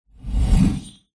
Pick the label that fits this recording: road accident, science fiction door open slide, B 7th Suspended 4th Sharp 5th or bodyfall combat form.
science fiction door open slide